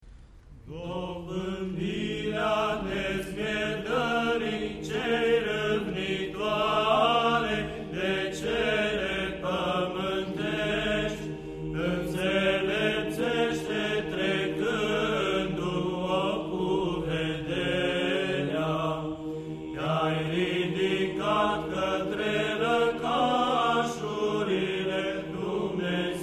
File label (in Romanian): Troparul; Altarul; Troparul Sf. Cuv. Parascheva; cantari ortodoxe